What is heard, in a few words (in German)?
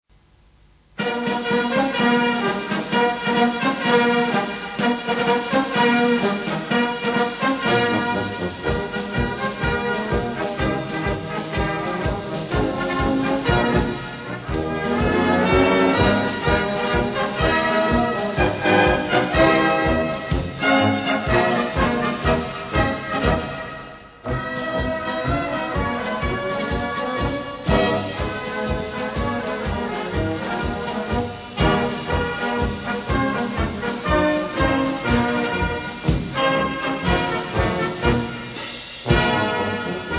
Wittnauer Marsch